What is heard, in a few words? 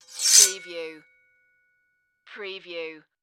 Sword Scrape Blade